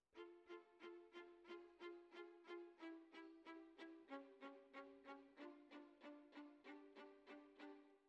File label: Violas, jazz for piano